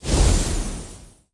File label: Vent Lift